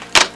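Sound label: TYPEWRITER; HAUSHALT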